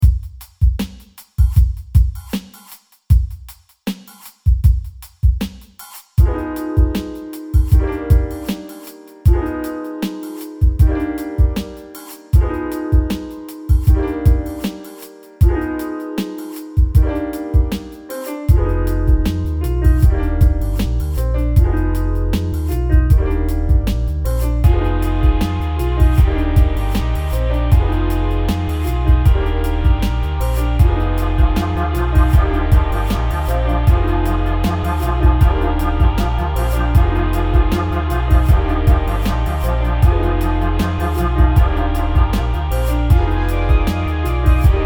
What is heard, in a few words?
hypnotic nighttime beat; weeklybeats 2012